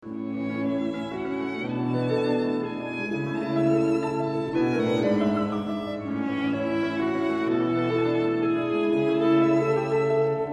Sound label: Quintet; Fibich